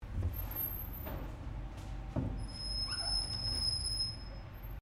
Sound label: DOORS DOUBLE SWING OPENING, Double swing flap doors; Miscellaneous Doors